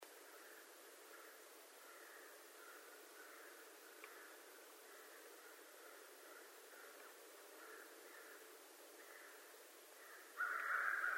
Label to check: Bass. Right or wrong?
Wrong